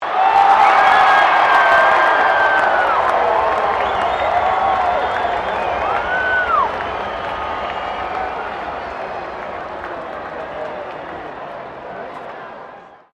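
crowd 15K Rugby World Cup cheer high, Rugby world cup game; Crowd Sound Effects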